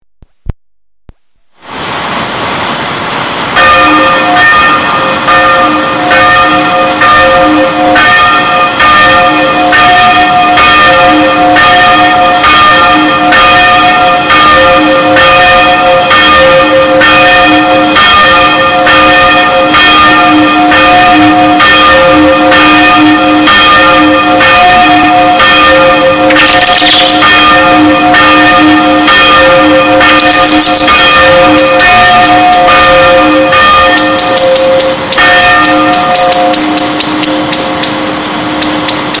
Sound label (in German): Glocke; Anna